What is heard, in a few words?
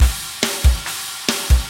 metal